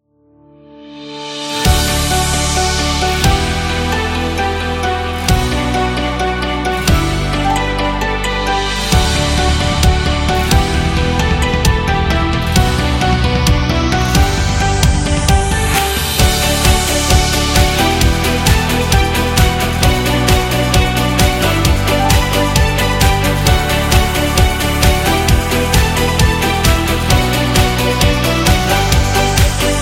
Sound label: Happy Pop